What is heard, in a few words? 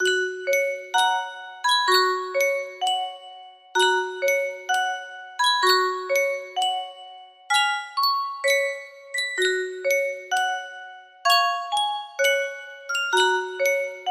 yunsheng music box, national anthem; jordan